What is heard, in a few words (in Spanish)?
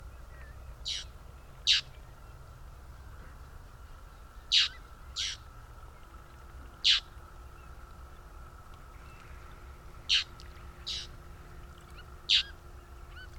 Martin Pescador Verde